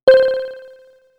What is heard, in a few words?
Vibra C, synthlead